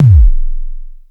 KLONE KICK DEEP, Klone Dual Percussion Synthesiser, DRUMHITS MONO